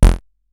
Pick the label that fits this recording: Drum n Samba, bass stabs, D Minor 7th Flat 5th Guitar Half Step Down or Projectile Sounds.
bass stabs